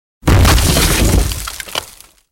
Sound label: impact wood; BRYTLJUD; TRÄ